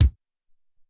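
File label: Tight Kick, Alesis SR-18 Drum Machines; TiteKik; 300 Drum Machines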